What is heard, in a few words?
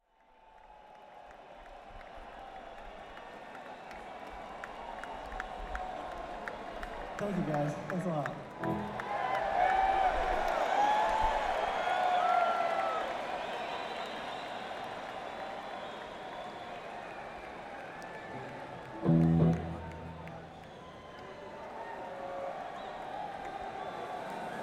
Crowd, Coliseum; Hampton, VA